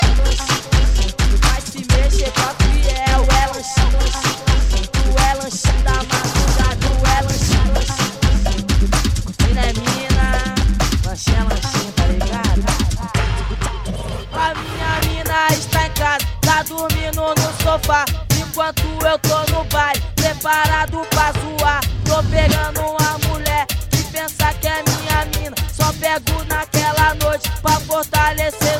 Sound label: Baile Funk